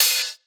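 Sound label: hi-hat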